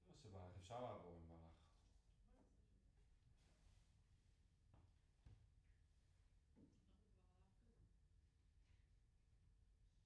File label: Piano Room